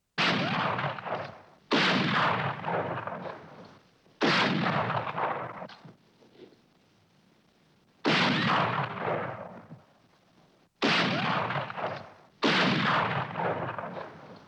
Classic Western Rifle

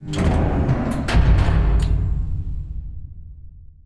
Lift Stop

